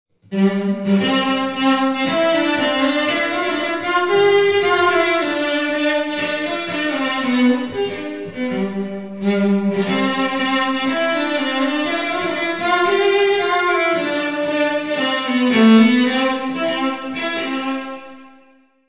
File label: cello concert; reverb demo